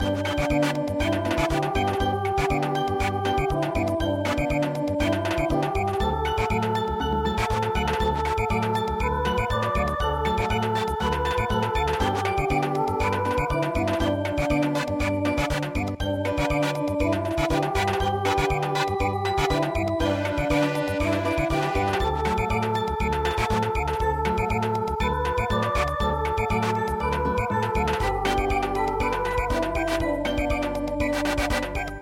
Samba, midi; Handsome Molly

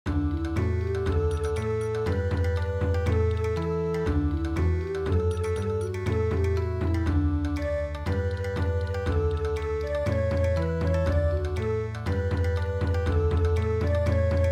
Bon Odori

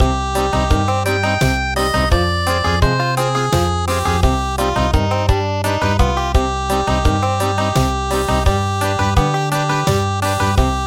Country, traditional

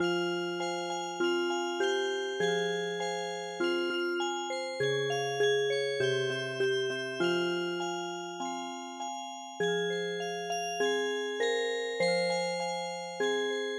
Music Box, traditional

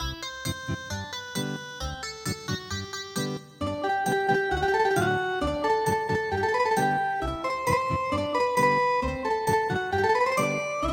Ethnic Guitar, midi traditional

